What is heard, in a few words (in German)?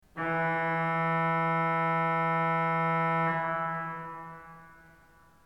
ton, orgel